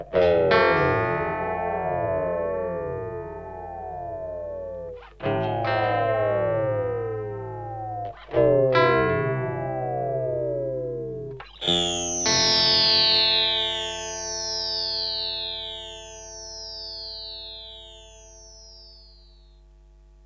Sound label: Red Panda Radius Freq Shifter Sine LFO